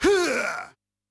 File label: HUUUUUH, Yamaha DD-65